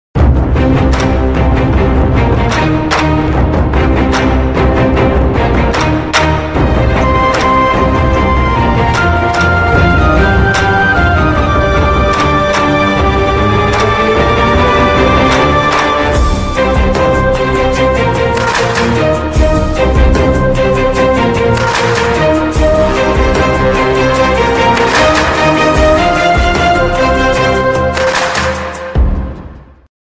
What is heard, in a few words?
friendship bgm ringtone; RRR